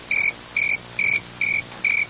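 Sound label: cricket monotonous ringing noisy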